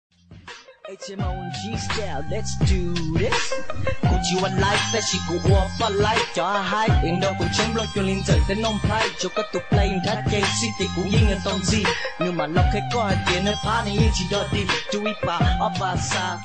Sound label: hmong music hmong rap